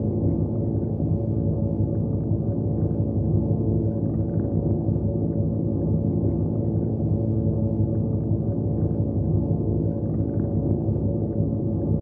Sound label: nuclear reactor; factorio 2.0.55